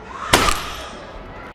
child shoot; Risk of Rain

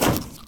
mush spawn; Risk of Rain

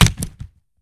pod hit; Risk of Rain

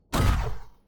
enemy gun fire; HLD

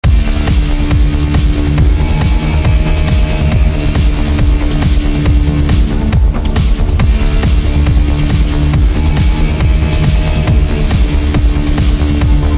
combat; synthetik

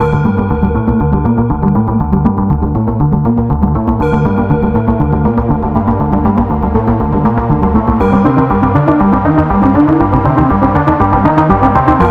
music electro idle; synthetik

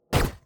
gun fire; drifter; HLD

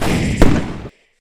clay spawn; Risk of Rain

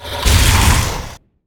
Lizard Shoot; Risk of Rain

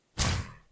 sword slash; HLD

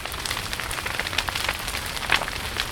Worm Burning; Risk of Rain

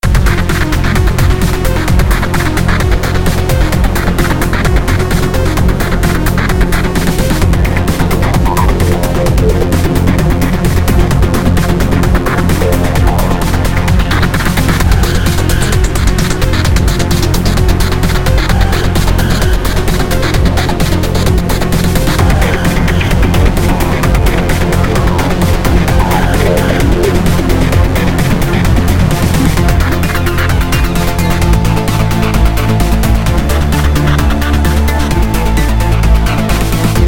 combat; synthetik

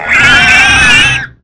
Pig Shoot; Risk of Rain

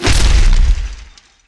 golem attack; Risk of Rain

